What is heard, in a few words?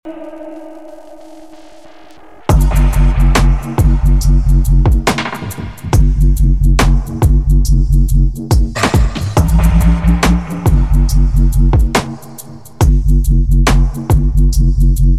Dub